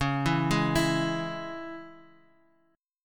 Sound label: Db Minor, Guitar, Standard; arpeggiated; 9,7,6,9,x,x